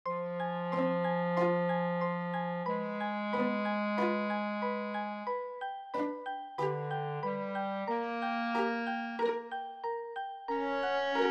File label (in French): melodie